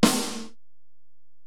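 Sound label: Acoustic Snare, Alesis DM-PRO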